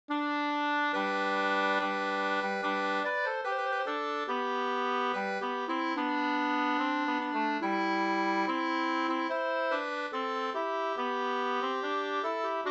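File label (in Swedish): brudmarsch Barkarby brudmarsch 2 stämmor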